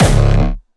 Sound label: Distortion Kicks Kick C#1; Bassdrums; Vengeance; Essential Clubsounds Vol.4; Bonus TonalHS Kick